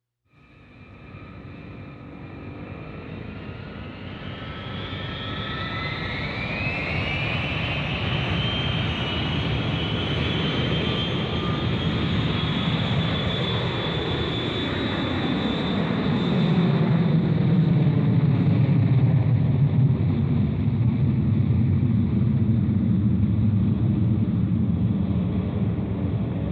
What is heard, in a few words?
707 Jet Take Off